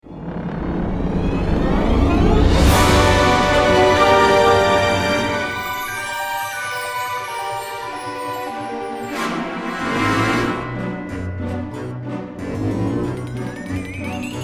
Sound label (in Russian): volshebnaia muzyka dlia fona bez slov